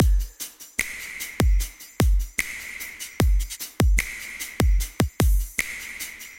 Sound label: Drums